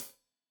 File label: Hat Tight, Hi-Hat, Cymbals; Deeply Sampled Drums